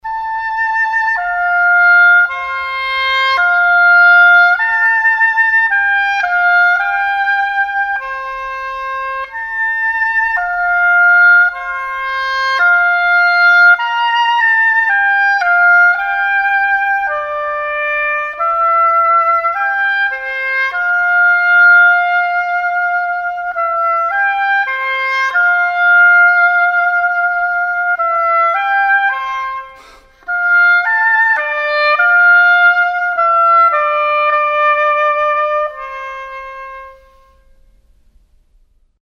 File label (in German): oboe